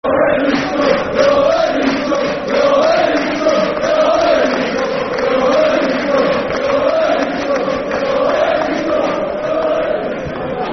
We All Hate Leeds Scum, Football Chants (Soccer Songs); Sheffield Wednesday Swfc